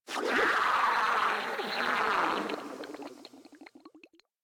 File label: Frogman Death, Vocals; Warframe; Grineer